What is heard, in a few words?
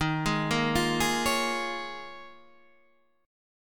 D sharp 7th Suspended 2nd Suspended 4th D sharp, Guitar, Standard; D#7sus2sus4 arpeggiated; JGuitar